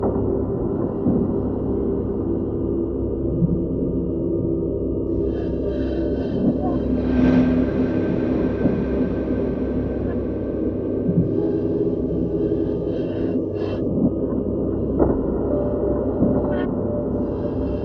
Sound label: woozy; Submachine 10